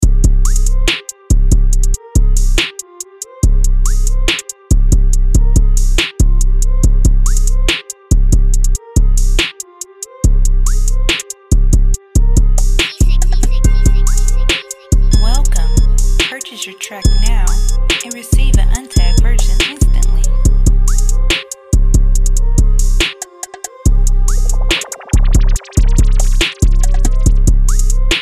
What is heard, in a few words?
trap club, Beat(说唱伴奏）Trap